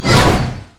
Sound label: anchorchain; nautilus